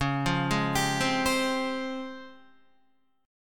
C sharp Major Sharp 11th, Guitar; C#M#11 arpeggiated; JGuitar

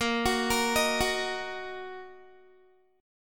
A sharp Suspended 4th Sharp 5th down Guitar; A#sus4#5 arpeggiated

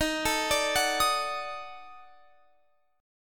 D sharp Minor Major 7th Flat 5th, Guitar Open F; arpeggiated

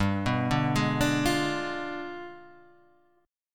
D Diminished 7th G

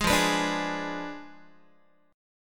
F Diminished 7th F down Guitar; Fdim7 strummed; JGuitar

